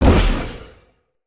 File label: Wolf Claw Idol Dash Whoosh; Orengall